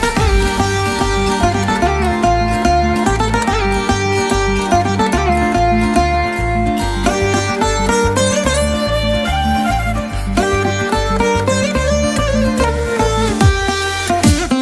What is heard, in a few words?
Bgm Instrumental; Kattappava Kanom